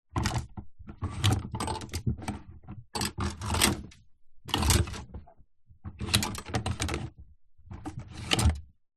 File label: household clothing movements on hangers in wardrobe